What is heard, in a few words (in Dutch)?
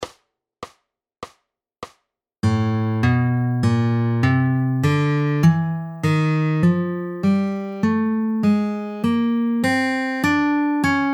fingeroefening 1234 oefening tempo 100